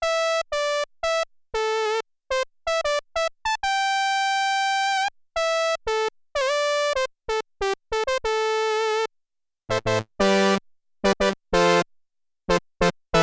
Single Prophet Brass, Prophet 5; Yamaha TX16W

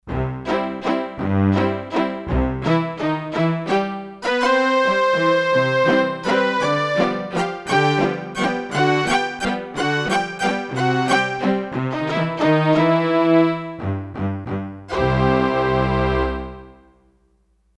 Violin Strings, Roland D-10; Factory Patches

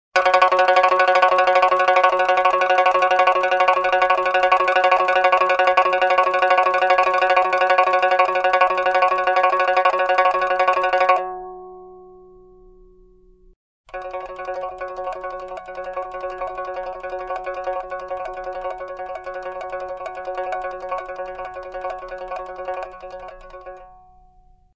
Pipa & Liuqin; Big Fish Audio

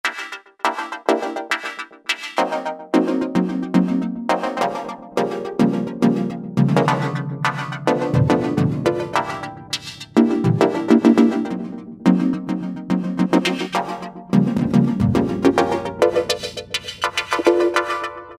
Tonal Tension; Roland SR-JV80-11 Techno Collection

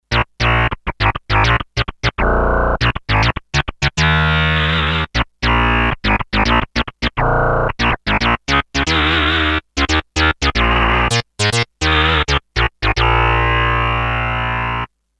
Cut bass, Sequential Circuits Six-Trak; Factory Programs

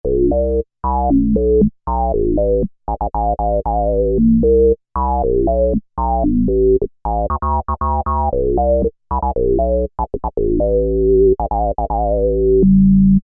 Warp Bass, Korg MS2000; Factory Patches

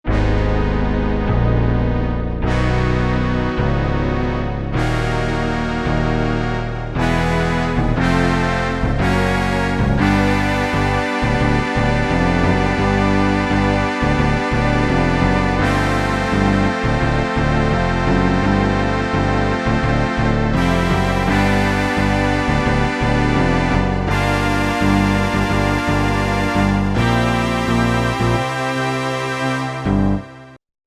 Korg MSC-1S Bass SynPD; Korg MSC-1S Variety

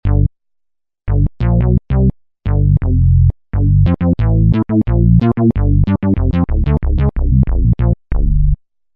Analog Bass, Roland V-Synth; Factory Patches

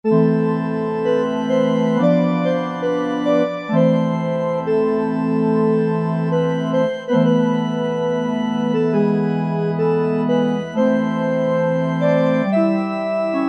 Sft Pipe Org, Alesis QS8; Factory Preset examples

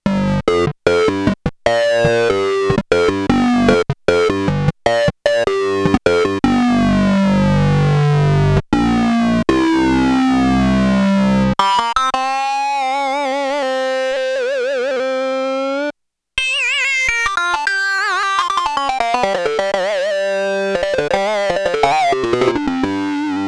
Sync Lead, Roland JX-8P

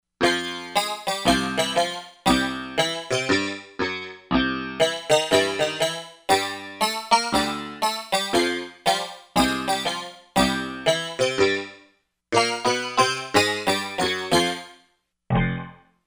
Tiptap, Korg MSC-13S Ethnic

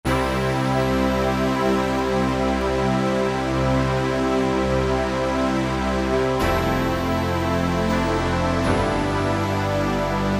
Spacepad; Optical Media International Sonic Images Library

